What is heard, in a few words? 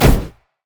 great wraith basic attack; LoL; monsters